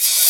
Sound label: Open Hihat, Cymbals; Vengeance Samples